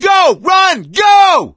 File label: yell run, gambler, survivor voice; source engine; left 4 dead 2